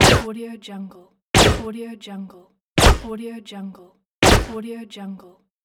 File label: silencer gun shot